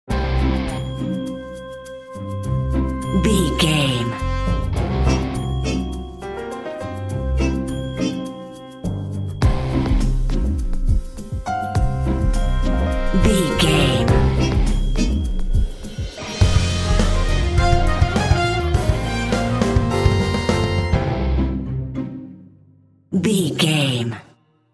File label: clumsy bumbler, silly, circus, goofy, comical; innocent; naive; child like; childish; Sitcom; Dramedy